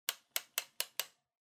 Electric Fan Height

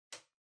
Calculator (Far Switch)

